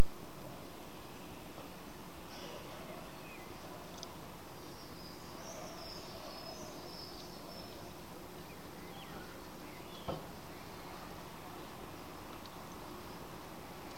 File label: square ambience